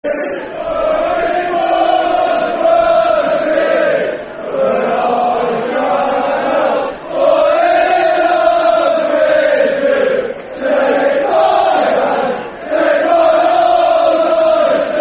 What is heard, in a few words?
Football Chant; Falling In Love Swindon; Swindon Town Stfc